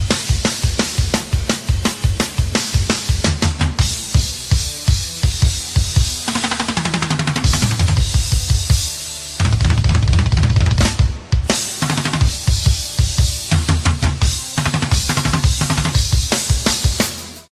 Drum Solo

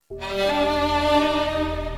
Cool Sms

